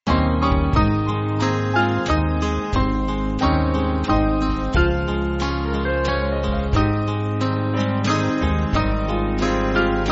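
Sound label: Band, Music Backing Files for Churches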